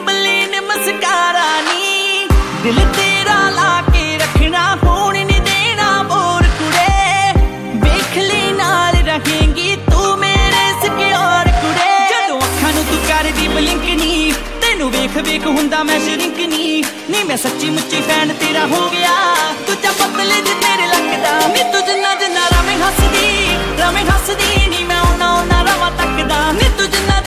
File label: romantic ringtone; bollywood ringtones